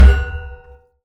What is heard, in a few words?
metal hit; reverberant